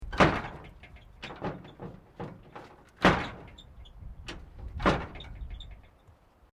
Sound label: Metal Corrugated Iron Wind Movement; Material components to create more complex sounds